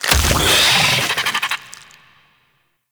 Colonist Rescue Spawn Pod; Warframe

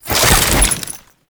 Ammo Menu Open; Warframe; Frumentarius; Ammo Ability

